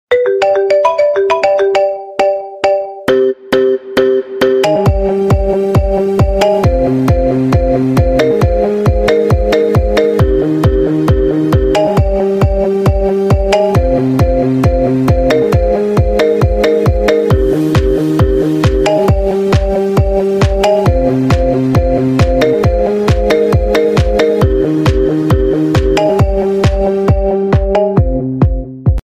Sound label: nice marimba